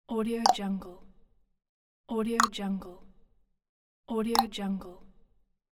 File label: human tongue click